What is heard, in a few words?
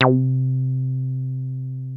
303 A2, TB 303